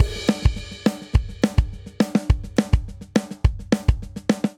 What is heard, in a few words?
Country Verse Variant 209 bpm, drum; 4 4; MIDI Clips; Ableton; Factory Packs; Drum Booth